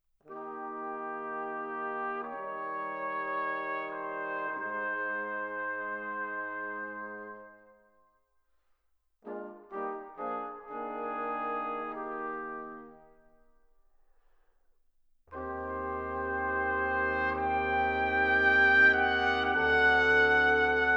String Quartet, Adagio non troppo Allegro non tardante